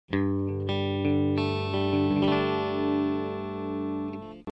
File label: C1 - Clean (Bridge+Neck)